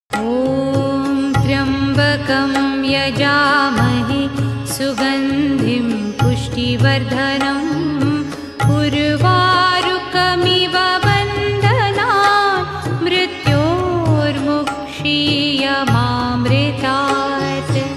female version; om tryambakam yajamahe

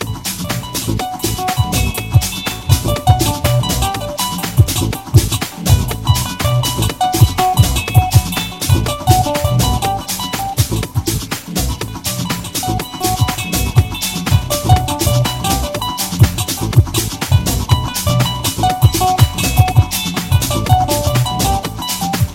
Vivo X60t Pro Plus

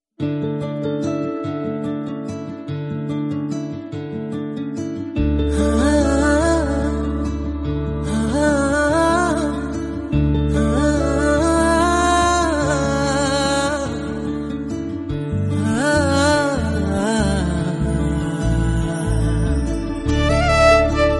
Flute

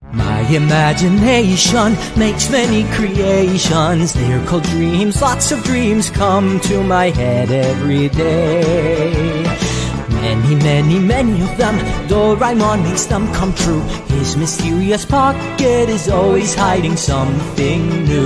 English Song; Doraemon

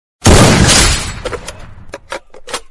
AWM Message; Free Fire

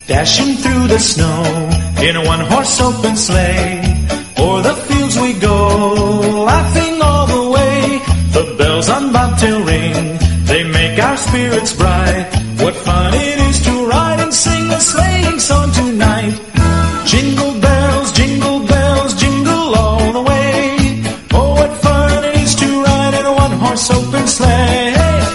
Jingle Bell

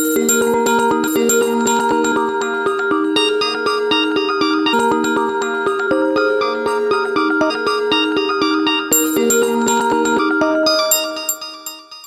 Iphone, ringtone

